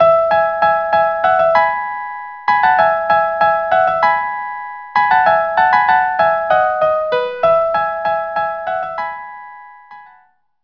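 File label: violin, violin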